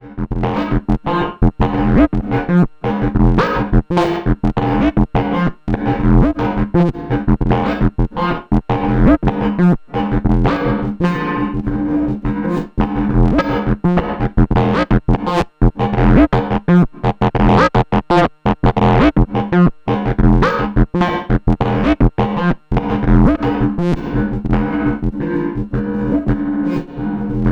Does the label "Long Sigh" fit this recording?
No